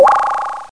weave

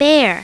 BEAR

